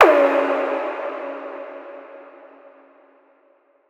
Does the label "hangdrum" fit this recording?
No